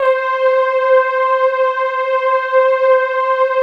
PAD, ATMO PADS; Best Service; XX Large

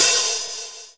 OH 8BIT; DRM FX; E-Lab; X Static Goldmine 2; akai

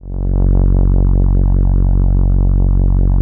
Atmo Pads; Best Service; XX Large

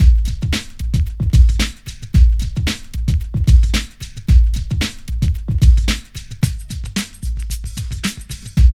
PHAT LOOP; E-Lab; X Static Goldmine 2; akai

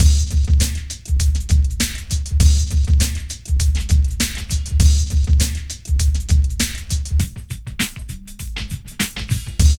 PHAT LOOP; E-Lab; X Static Goldmine 2

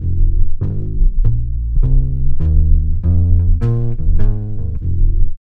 WALK BS; INSTR LOP; E-Lab; X Static Goldmine 2; akai

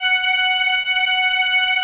ATMO PADS; Best Service; XX Large

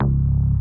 mega dub; ZERO-G; Datafile

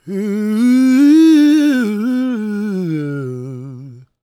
GOSP MALE, Vocal Planet Gospel

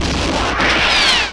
FX GUNS; MIX FX; E-Lab; X Static Goldmine 2; akai

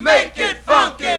VOX; ZERO-G; Datafile

